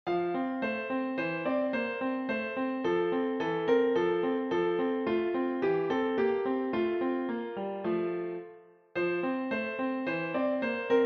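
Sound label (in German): Lasst uns froh und munter sein, Klavier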